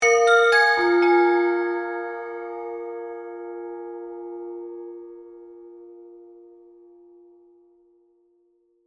Musical Chinese, Production, Element, Musical, Chinese, Oriental, Bells, Accent, Mysterious; Musical Sound Design; BlastWave